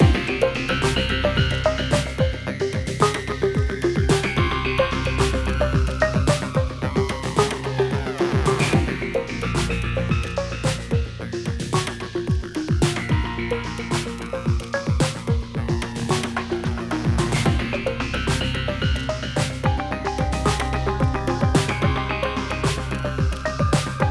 Suspense; thief simulator 2018 pc gamerip

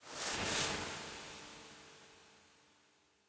echoes; thief 2014 gamerip

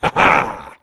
Laugh; super trench attack 2

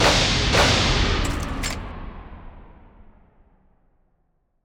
Deduction Failed; the great ace attorney chronicles

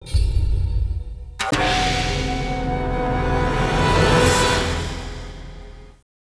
The Curtain Falls (Game Over); Samurai Shodown; Samurai Spirits 5; Neo Geo; arcade

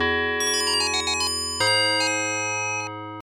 Unknown Jingle

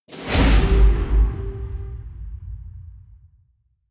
String; Assassin's Creed Unity Dead Kings

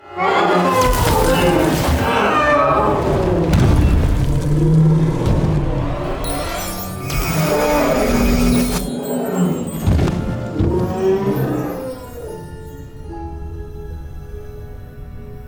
cutscenes outro sfx; zamaron

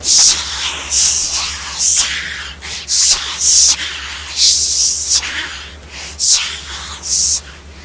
Teleporter walk

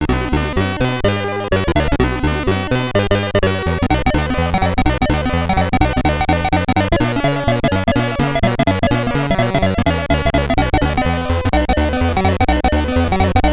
In-Game BGM, SID Stereo; Troll; Commodore 64

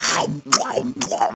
eating

